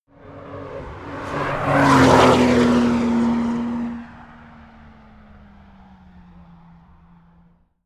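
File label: sports car exterior porsche gt2 drive by fast; verkehr-transport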